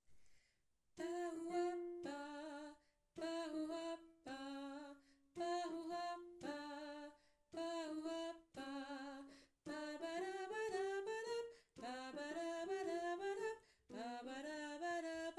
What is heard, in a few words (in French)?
canon scats